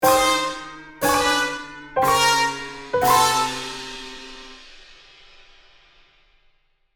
Comedy cartoon wah wah wah fail trumpets

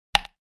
Selection button interface classic

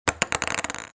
Table tennis ping pong balls drop on table

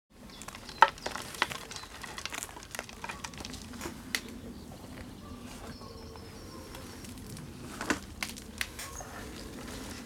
Potting plants nature garden ambience

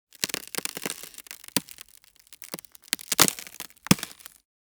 Ice heavy pressure crack

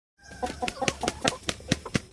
Chickens flapping wings

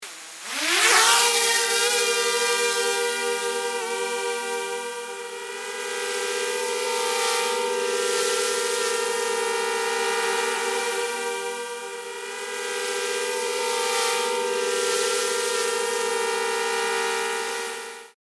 Buzzing drone flying movement noise

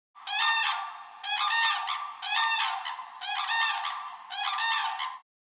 Common crane call grus grus

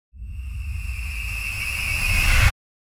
Granular madness riser

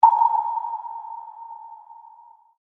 Submarine sonar ping scan single beep

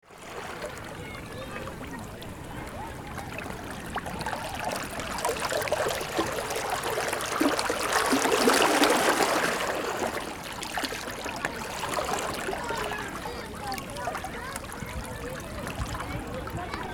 Gentle ocean waves people chatter

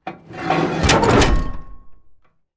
Armored tank cannon loading

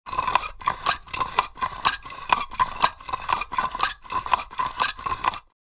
Rusty throttle controller friction movement

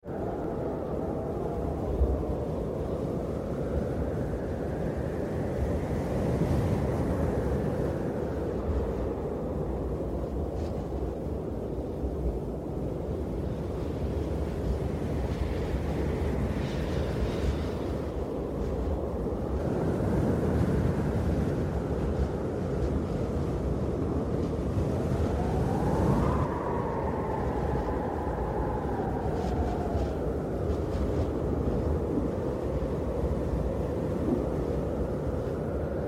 Snowstorm icy wind blowing

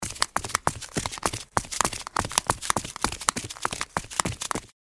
Flip flops run on concrete

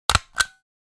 Stapler stationery office push and pull

